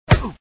hit; Gun Mayhem 2

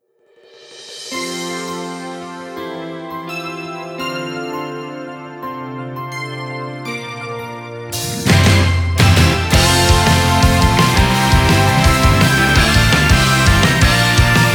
off vocal; Clochette; OP Song Collection

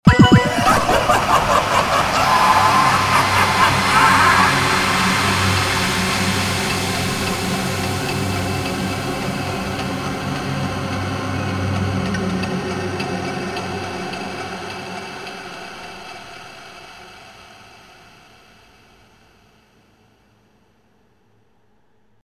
Loading Jingle; Death Wish

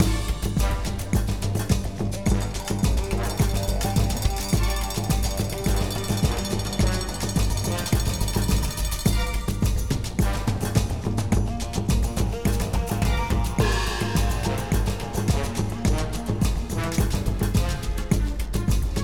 Guards Panic LOOP; bolt 2008 pc gamerip

